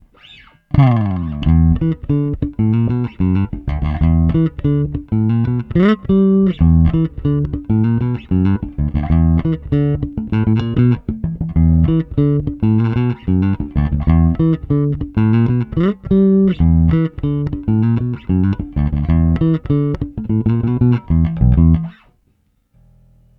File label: Jazz both Funk